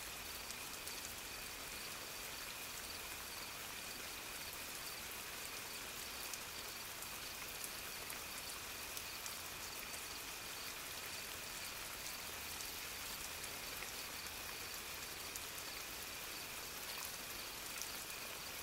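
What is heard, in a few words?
noch dojd, night rain, old city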